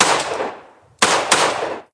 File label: Pistol shots